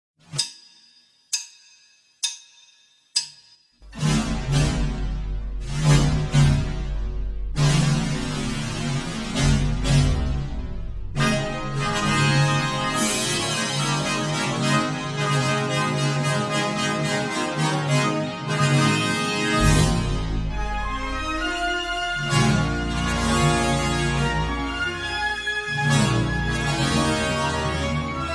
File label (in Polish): fanfara